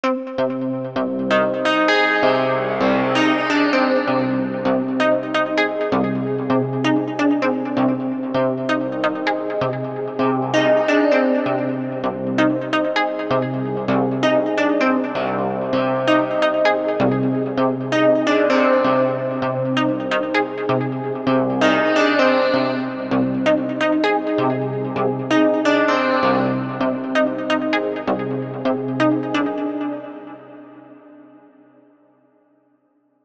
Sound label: silver poly; porphyra hybrid by ocean swift synthesis